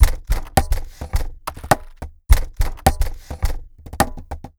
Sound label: DTM beat